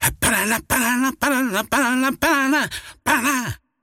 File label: singing; dota; largo